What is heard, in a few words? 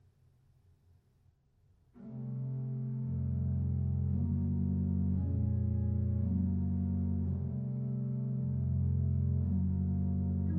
Chorale